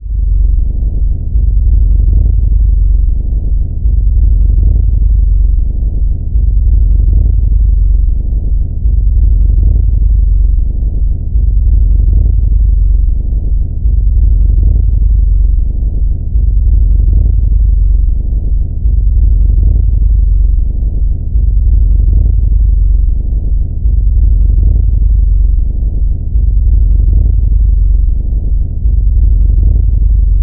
ambience texture, Scary Sound Effects And Ambiences; Bluezone; Nightfall